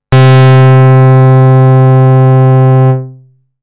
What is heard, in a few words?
Basic Pulse, synthlead